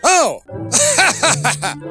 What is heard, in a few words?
OH HAHAH